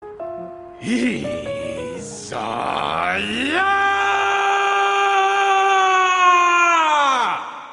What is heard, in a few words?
IIIIIIZAAAAAYAAAA